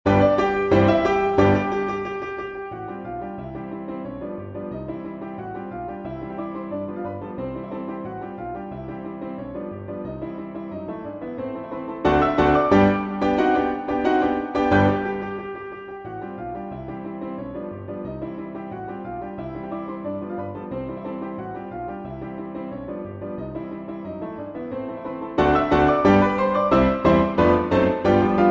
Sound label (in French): Polka schnell, 2 pianos 8 mains; Strauss J II; Frisch heran; op. 386